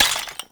Clay Pot Destroy; Gameplay; Awakening Remaster

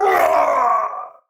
Death, Battle; en; Tau; Tau Dax Knight

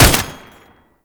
Tank Turret Shot; Enemies; Weapons; Warframe; Lasrian; LasrianTank

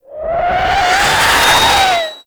Artillery Whizzby; Enemies; Abilities; Warframe; Teralyst

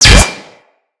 Bolt Impact Metal; Weapons; Cross Bolt

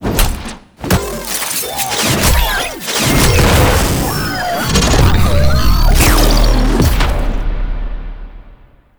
Finisher A; Jackal Redux; Cinematics

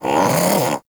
Armadillo Vocal Alerted; Warframe; Characters; Hunting Critters

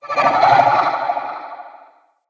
Corrupted Slug Vocal Attack, Creatures

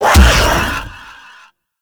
Whirlwind Dash Impact B; Warframe; Warframes; Caliban

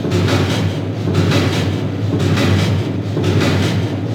Toxic Machine A Loop; Ambience; Props; Loops; Warframe; Venus Open World; Venus Landscape

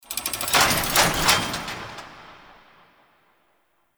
Arsenal Platform Rise; Warframe; Ambience; Nineteen Ninety Nine; Interior; Tailor Shop

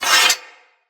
One Handed Axe Elite Unequip; Weapons; Enemy; Axes; Sinecure One Handed Axe Elite

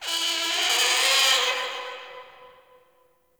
Interior Whiney Critter, Infested, Ambience; Misc Indoor

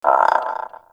bird crow caw; Ambience; Warframe; Eidolon Plains

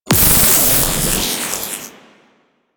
Corruption Pickup Hostile Large; Warframe; Missions; Zariman

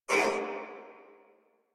Pain Crewmen, Battle Barks; Dialog; Warframe; Corpus Crewmen; Crewman C

